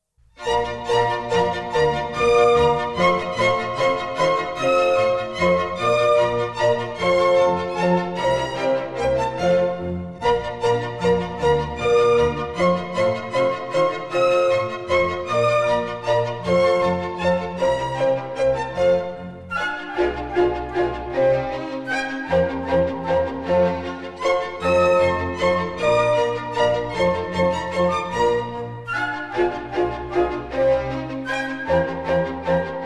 German Dances, Bb major

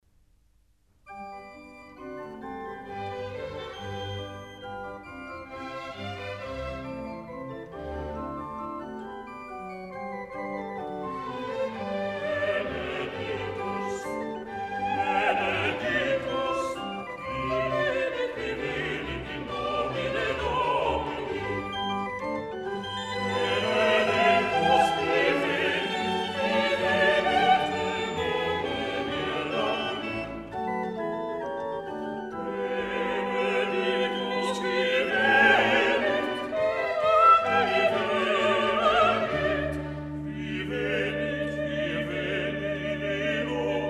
Missa brevis in C Organ Solo Benedictus; Mozart; Davis, Gardiner

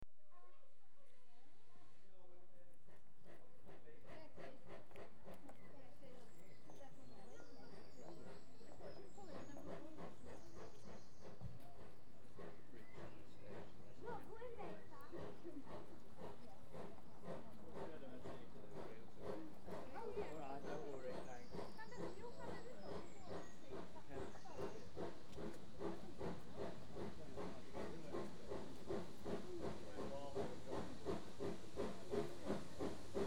Train Arrives, People Disembark; BBC Sound Effects Library; Age Of Steam

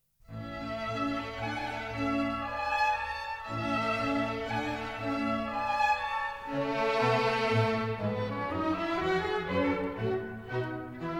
Minuets, A major; Mozart; Dances and Marches

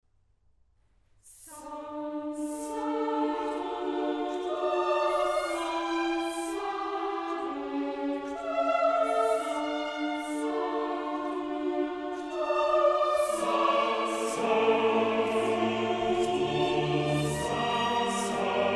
Missa brevis in D min, Sanctus; Mozart; Missae and Requiem; Davis, Gardiner

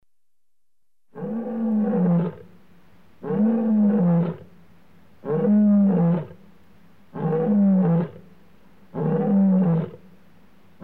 Male Lion roaring, clean background; The BBC Sound Effects Library; Africa- The Natural World

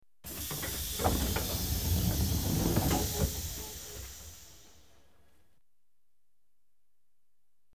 London Underground Trains Dist; The BBC Sound Effects Library

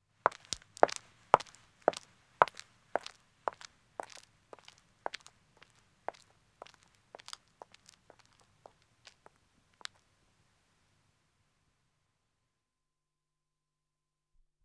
Country Road Walking 1 woman; Footsteps; The BBC Sound Effects Library

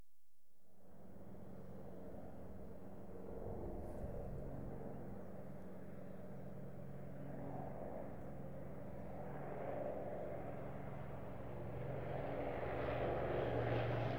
FLY LOW OVERHEAD, Aircraft; The BBC Sound Effects Library

